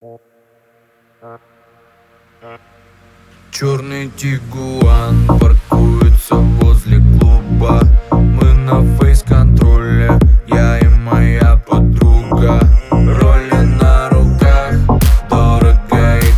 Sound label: rumba